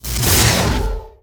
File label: Scifi Generator Engage; Energy & Forcefields